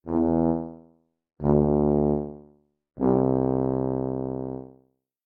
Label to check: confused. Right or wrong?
Wrong